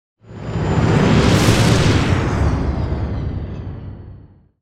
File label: Spaceship passing by; Sample Audio Pack; Free Pack